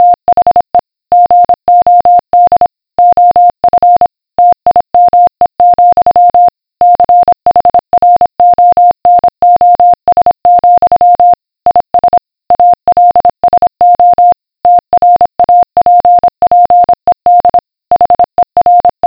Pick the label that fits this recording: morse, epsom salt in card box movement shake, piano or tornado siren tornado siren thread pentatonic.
morse